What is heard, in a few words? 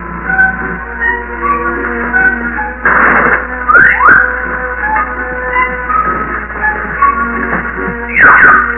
music; mall music; Dawn of the Dead; Monroeville, PA; George Romero